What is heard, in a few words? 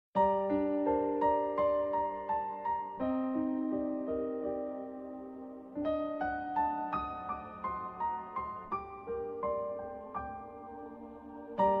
soft instrumental